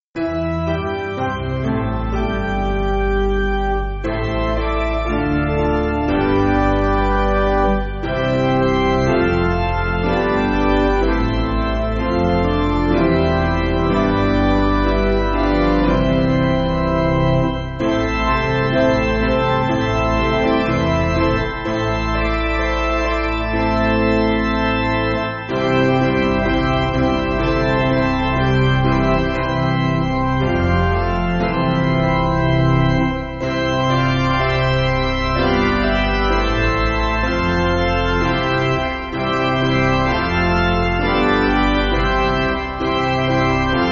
Chinese, Piano, Chinese Traditional Melody, Backing Files for Churches; Joyful Tidings Bring